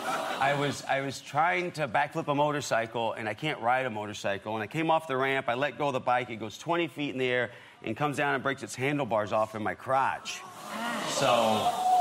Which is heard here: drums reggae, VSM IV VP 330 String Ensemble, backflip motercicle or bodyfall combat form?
backflip motercicle